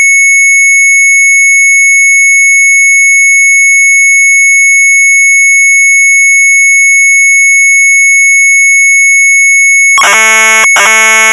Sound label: Sharp MZ800 Tape; retromelsarchive